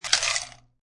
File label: Dumping ice into glass.; Drink Mixing; Bars